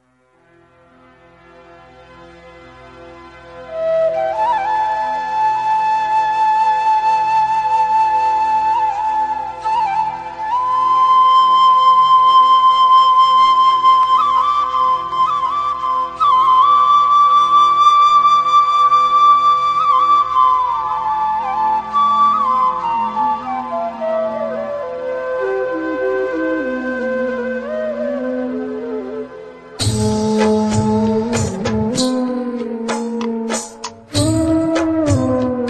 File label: Aarti Flute Ringtone